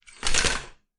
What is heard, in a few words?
curtain open; Papers Please